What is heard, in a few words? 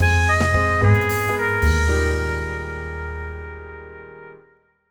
win jazz shutdown